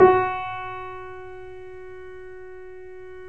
3D Pianos Yamaha C7; E-MU Producer Series Vol. 5

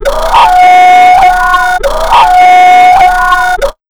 JUMP 130 FX, Big Beat Drumloops; USB Soundscan vol.25; AKAI

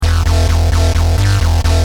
Driven, Bass; Industrial

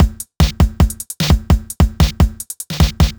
150 Drums, 150bpm, Nu Dance Electro; Combi Loops; Heres Johnny

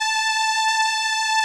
SOLINA, String Machines

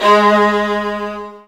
Virtuoso Strings

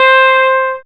synth, c, Top End Tinklers

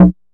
grind, EMU XL-7

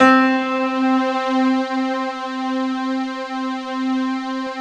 PIA Piano String; Sound & Vision; Gigapack I CD 2

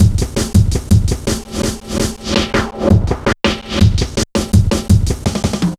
Hard Edged, Drum Loops, 165.5

